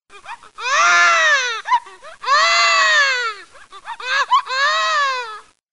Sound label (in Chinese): 婴儿大哭